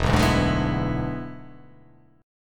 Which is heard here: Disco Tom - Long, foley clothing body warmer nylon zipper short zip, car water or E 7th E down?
E 7th E down